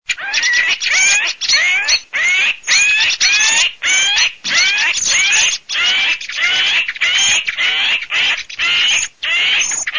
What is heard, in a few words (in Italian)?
GHIANDAIA BOSCO